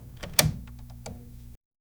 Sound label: opening up a monitor, devices